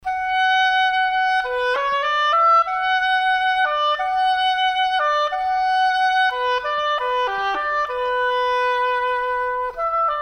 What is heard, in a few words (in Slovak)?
Hoboj; oboe